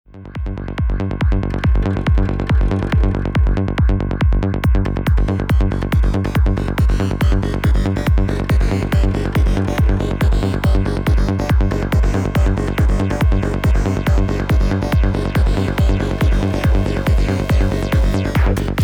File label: Trance Mix Unprocessed